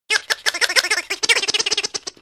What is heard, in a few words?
squeak; WMBonusXP